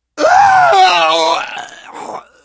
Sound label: death scream, biker, survivor voice